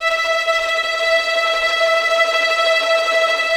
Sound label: VI TREM F